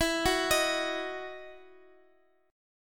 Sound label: E Major 7th Suspended 2nd, Guitar, Open F; EM7sus2 arpeggiated